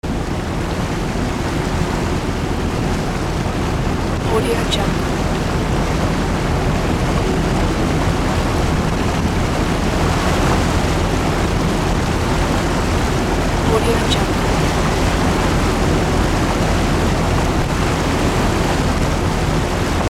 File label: boat in the sea sounds